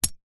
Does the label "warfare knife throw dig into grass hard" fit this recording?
Yes